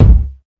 lofi kick

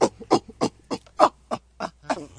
100 ape grunt beatbox; 091 to 100 bpm

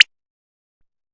8 bit kit chirp; LO FI and 8 BIT KITS; 8 Bit Videogame Kit

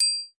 triangle; Triangle Kit; ETHNIC and WORLD PERCUSSION

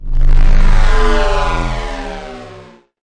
new starwars ship flyby; Electro and Synthetic

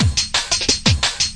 175 bpm pufferfish dnb

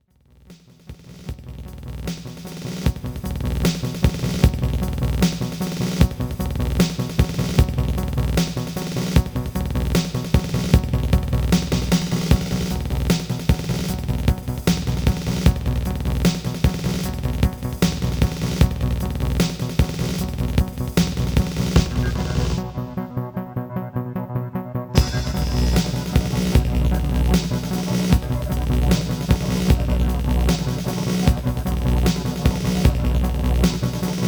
Tremolo

